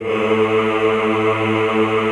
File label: EHH A1, Classical Choir; Best Service